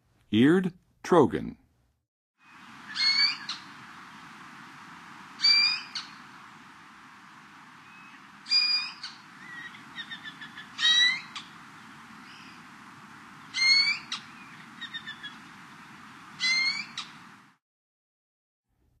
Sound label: Eared Trogon, Songs of Birds of North America; Western USA; Western USA Songbirds